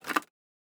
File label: Wood Handle; Survival Game Sounds - Lite Edition; Environment